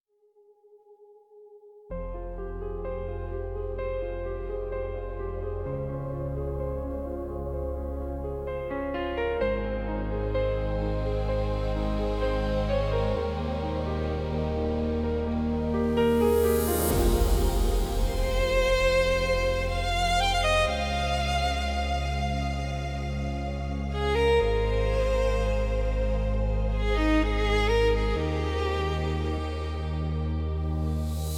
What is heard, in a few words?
trance violin